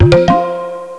Tabla Talk; Soldiers 2

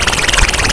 bubbles; Terra Sound Library; funny

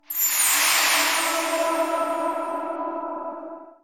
item rebirth; FantasyTennis

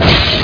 Menu Command; Desktop Themes 2000; Comic; Beast Wars

